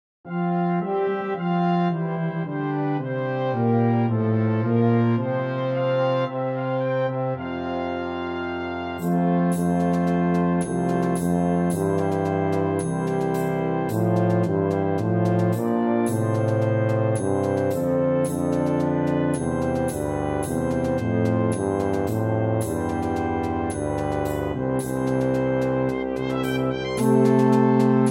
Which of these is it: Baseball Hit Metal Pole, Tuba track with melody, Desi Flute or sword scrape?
Tuba track with melody